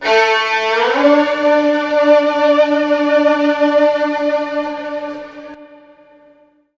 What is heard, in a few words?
YES STRG, Roots Disco; USB Soundscan vol.32; AKAI